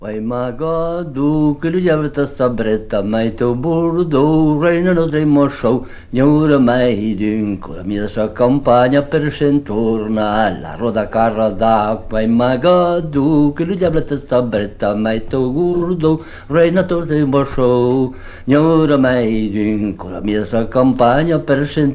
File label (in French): Bourrée; Bourrée à Magadou